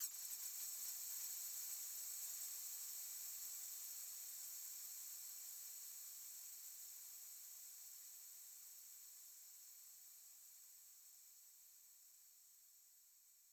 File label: FX Impact; 99Sounds; Monosounds